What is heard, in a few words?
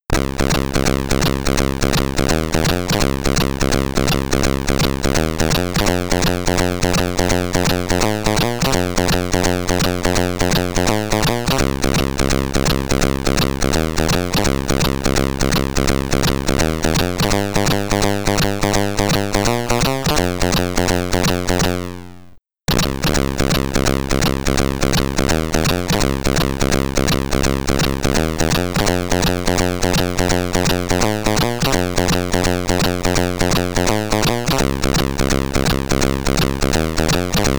SID Stereo; Alien Syndrome; C64